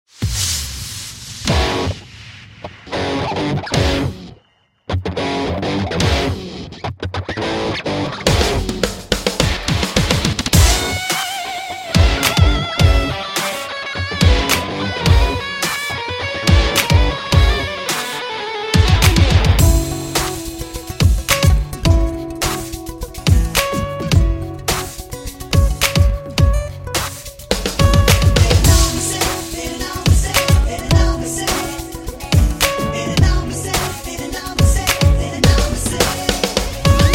Romantic Theme

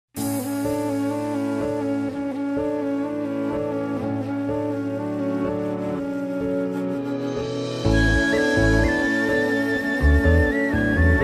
Female Cover

